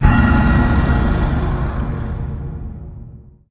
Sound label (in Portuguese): rosnando baixo